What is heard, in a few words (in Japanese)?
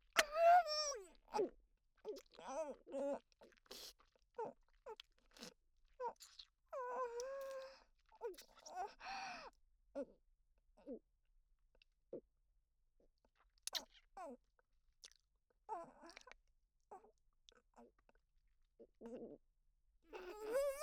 イラマチオ・恐怖に泣きながら精液を飲むも限界で吐いてしまう風な声; ストレートお姉さん系カノジョ